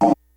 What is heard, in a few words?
GREASY KIT, DRUMS; Kid Nepro; Elite